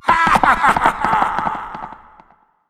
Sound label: tower necromancer death rider